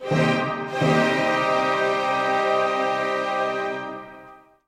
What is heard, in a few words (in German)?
Akkord